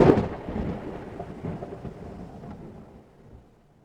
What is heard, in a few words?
small thunder